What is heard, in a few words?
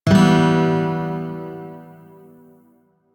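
Shawzin Major Chord; Music; DE; Warframe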